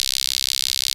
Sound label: Guira, KorgMP7 drums